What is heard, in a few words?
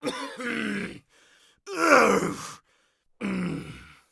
agony; Escape from Tarkov